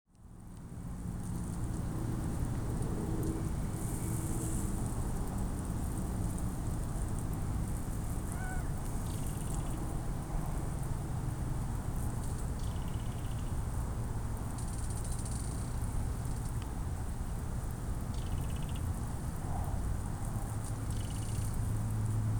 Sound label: belted kingfisher